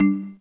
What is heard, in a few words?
Drop; aitetris